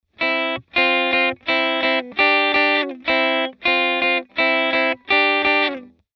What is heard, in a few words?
Guitar